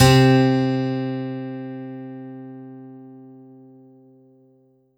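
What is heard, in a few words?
Kawai K5000W 12 String Guitar C3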